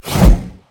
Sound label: swipe; smite; god cthulhu